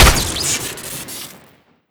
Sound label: tommy shoot crit; tf2classic